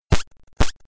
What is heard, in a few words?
footstep